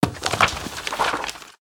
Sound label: Parchment Book Open